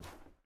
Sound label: Step Carpet; Game rip; MiSide